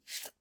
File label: draw Knife; Kenney's Sound Pack; RPG Audio